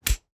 Slap; SoundsCrate

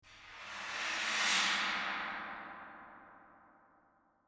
gong swipe

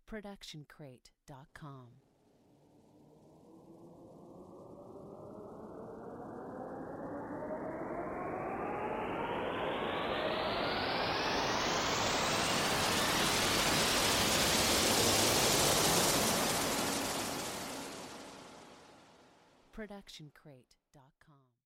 Cinematic Riser

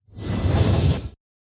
ghost whoosh; soundscrate

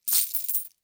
coin sack drop; soundscrate

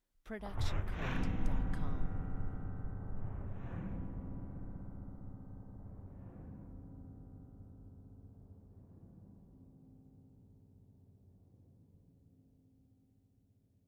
Horror Piano Rumble; SoundsCrate

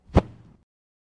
block from hit

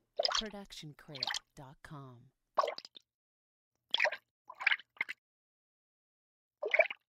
water splash; soundscrate

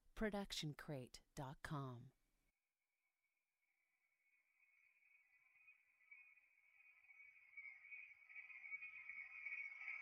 reverse shimmer; soundscrate

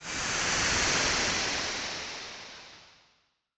Anime Air Movement

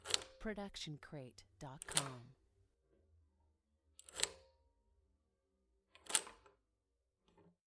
house doors locking; soundscrate

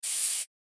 hiss; soundscrate

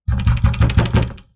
wood door pounding outside 5 times

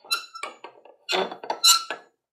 Squeaking; soundscrate

